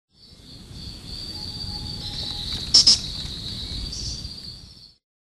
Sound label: Blommersia blommersae